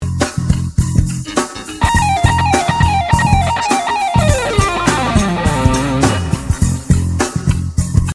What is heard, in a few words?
Lick; Guitar Club Dicembre 2025